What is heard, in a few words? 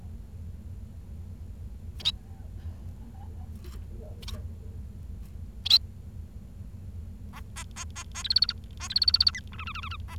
bengalese finch